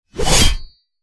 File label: sword strike, warrior; A succubus is born; combat